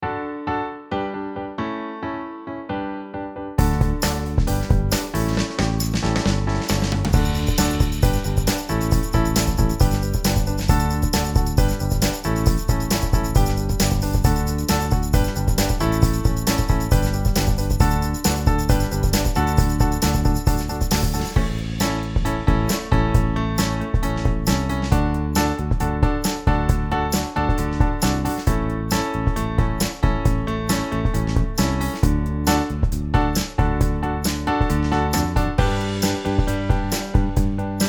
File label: Jungle Train